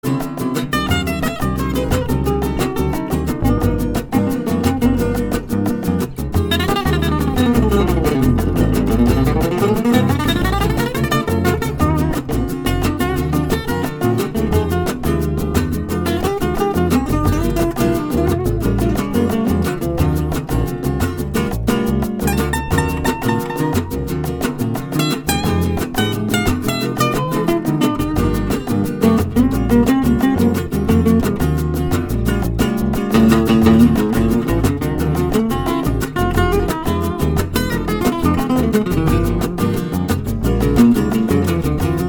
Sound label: bossa; dorado